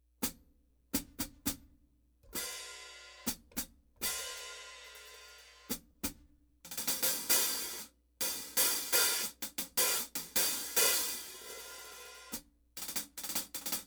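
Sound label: Sabian 14, cymbal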